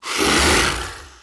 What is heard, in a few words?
zombie skeleton